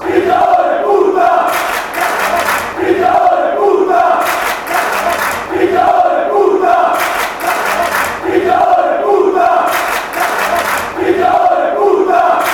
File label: chants